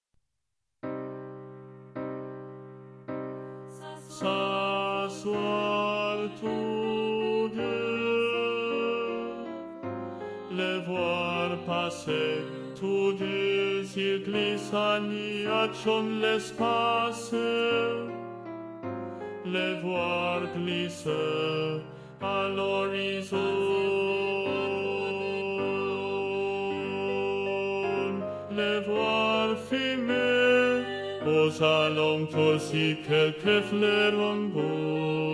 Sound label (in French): alto 2 et baryton; Au bord de l'eau Gabriel Fauré